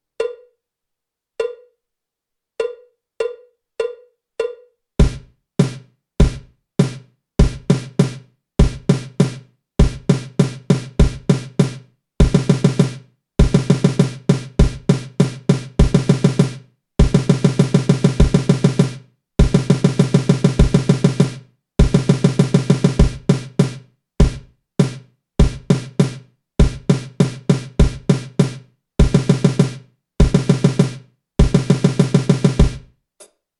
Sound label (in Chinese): 架子鼓独奏 热身独奏; 66条架子鼓独奏SOLO